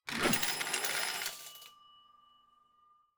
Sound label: count Up; cashomatic